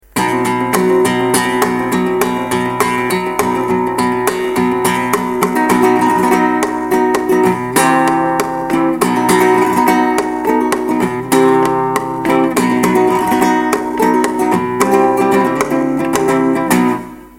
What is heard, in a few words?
Bulerias